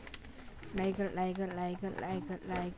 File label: laugh